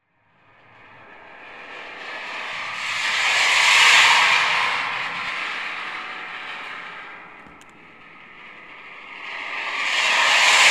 EFX SD Metal plate jet bys; Coll Anderson

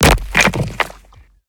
Rocks Impact Hit Big LFE Heavy Designed; PMSFX; Rocky Impacts

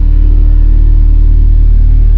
tunnel, sewer ambient areas; hl2

